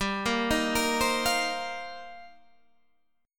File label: D sharp 6th Add 9th, G